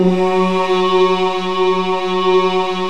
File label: OOH VOX S